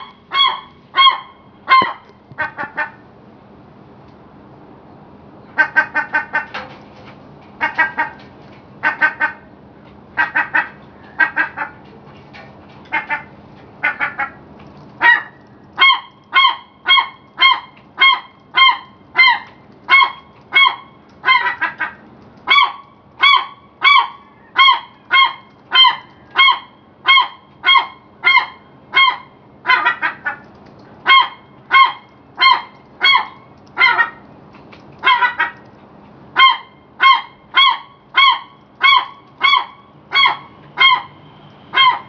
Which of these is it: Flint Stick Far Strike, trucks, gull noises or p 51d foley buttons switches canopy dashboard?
gull noises